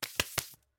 Cheerful; Mita; miside demo windows gamerip 2023

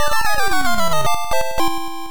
Kick Failure; Gridiron Fight; arcade

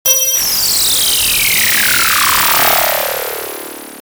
Game Ended; Breakout Defense; Wii U

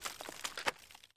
stalactite break

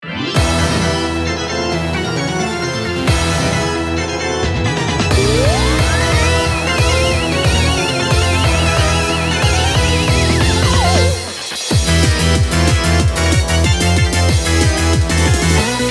battle

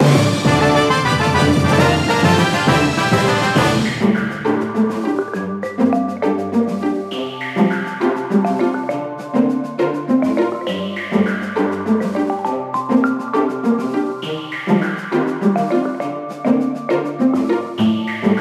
Leave Port Fanfare; Steel Diver

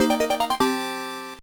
Received Item; Fire Emblem Fuuin no Tsurugi

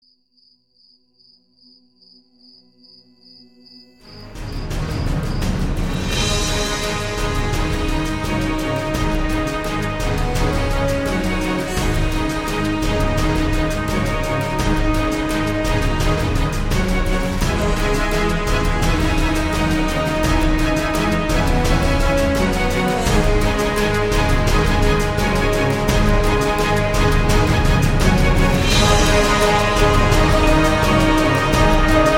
main menu sound; Caravan Beast

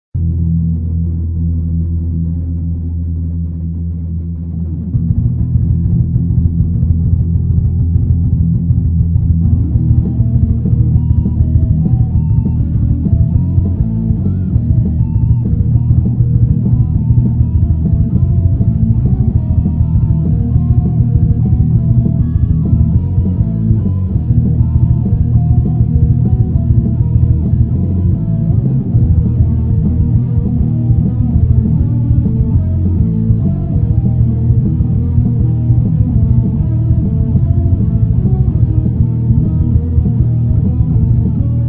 Muffled; Territory War 3 Online

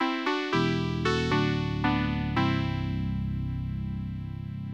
Title Jingle; burning dragon plus pc 9801 opn

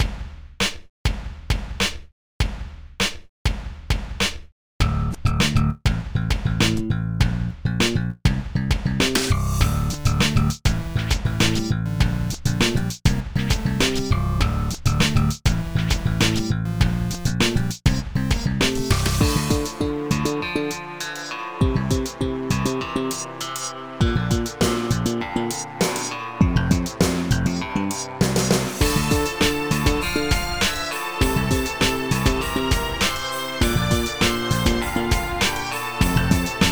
City Funk

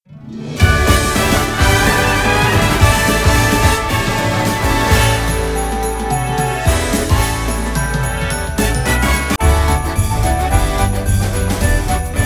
BGM Battle; aster tatariqus android ios mobile gamerip 2023; BGM Battle Candlelight

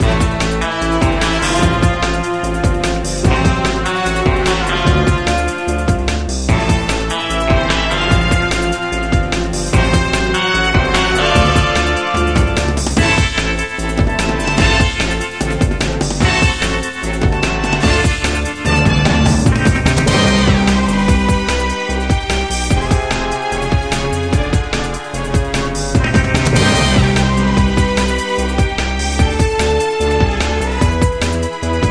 Music

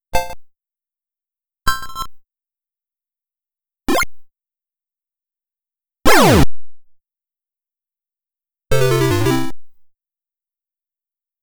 S.E. COLLECTION; Lovely Card

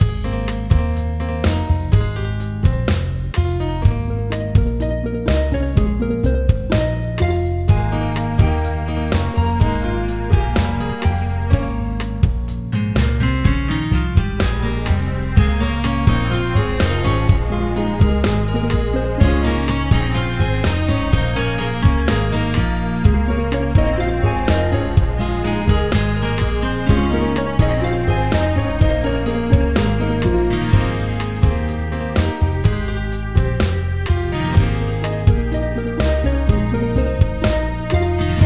Ingame Music; Animal Planet Vet Life; NDS

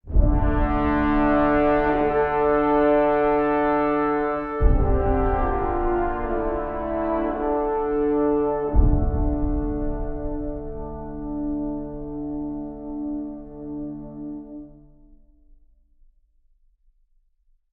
interlude fanfare; Tyranny Gold Edition

